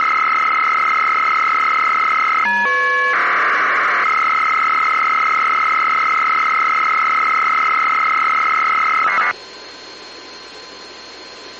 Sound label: AFSK Paging Sound